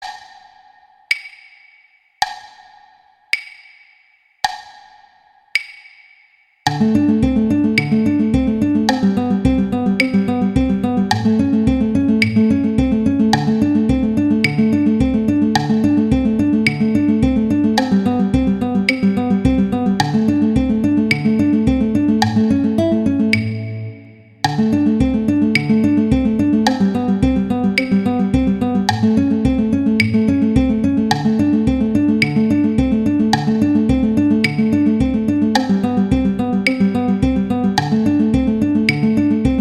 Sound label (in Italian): Andante Violin; Paganini; Sonatas Op1 Vl Gu; Sonata No 3